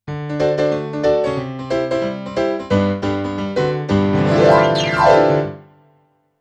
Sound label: Piano, RNR for piano